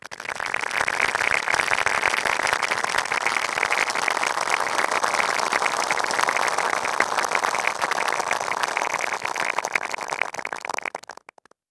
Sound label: polite applause